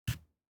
DICE on Felt, Grab, Standard, 1 One Die; Samples; ArticulatedSounds; DICE Sfx Party (Extended)

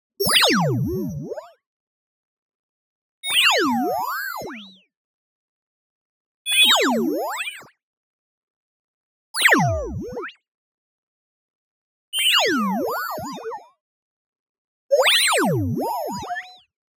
tonal, noise filter resonance modulation high; boom library; Anime Essentials

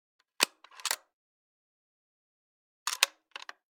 .223 Rifle Work Action; Hollywood Edge; Heavily Armed

